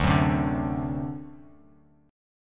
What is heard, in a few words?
D 7th, down; D7 strummed; JGuitar